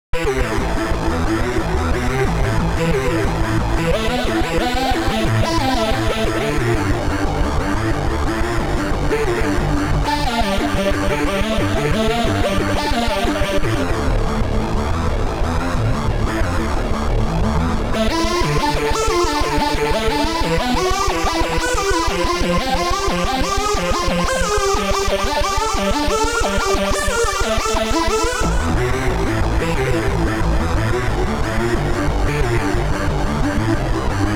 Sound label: aero arp; the space soundset